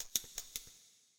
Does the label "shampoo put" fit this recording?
Yes